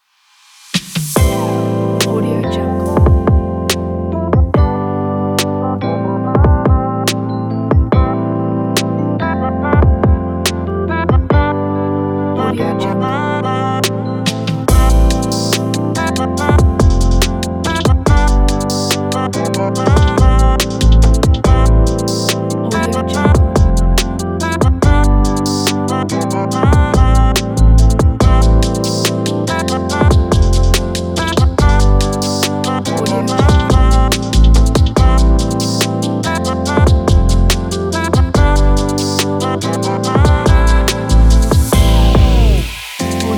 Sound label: stylish fashion modern rb kit